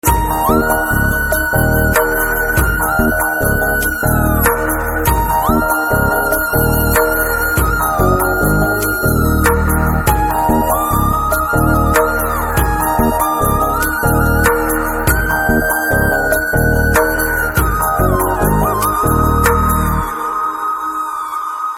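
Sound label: background music; Eeswaran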